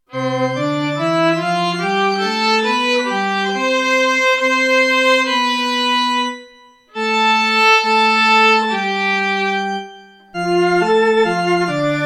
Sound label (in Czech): Varhany Housle Varhany Pricna Fletna